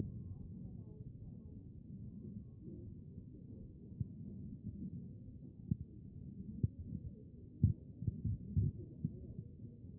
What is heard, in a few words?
A CHEST; B MODE